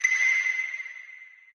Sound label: chime